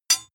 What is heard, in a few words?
sword blade rattle short